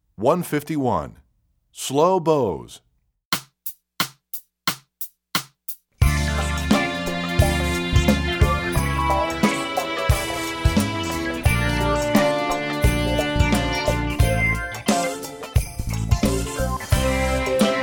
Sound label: Slow Bows, Strings; EE2000 Book1